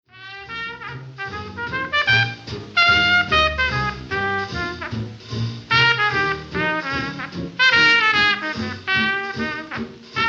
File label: MAINSTREAM JAZZ